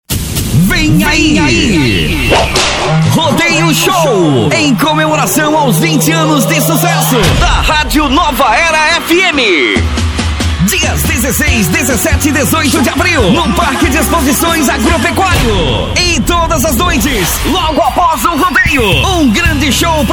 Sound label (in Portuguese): festa sertanejo